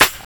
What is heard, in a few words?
Clip Snare, EMU PX-7 Drum Machines